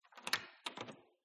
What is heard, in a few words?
Door Open, Natural Reverb, Lock Mechanism, Knob; PMSFX